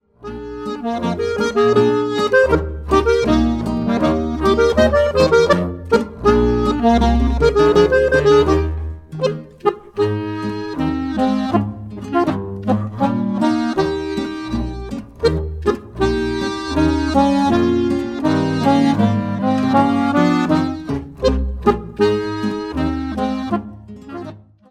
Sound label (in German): Boarischer; Hopfazupfa